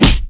PUNCH

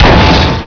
CRASH HAR; ROADRASH

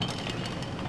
MECH; KRONDOR

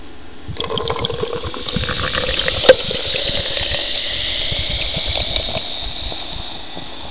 POURING

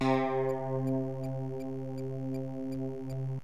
Jupiter C; JUPITER6; cubase; CUBASIS

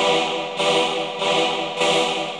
KEYB; MAGIX

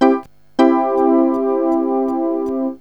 MAJOR, DIGIPIAN; KEYBOARD; cubase; CUBASIS

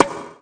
KLACK

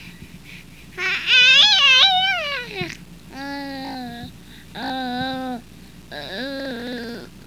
BABY

